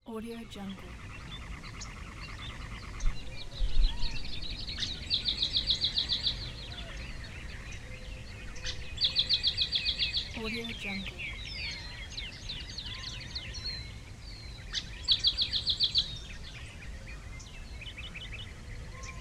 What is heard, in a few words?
morning nature